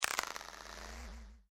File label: Click Plastic Sequnence Very Strange, User interface feedback sounds; Multimedia